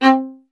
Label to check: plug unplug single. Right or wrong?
Wrong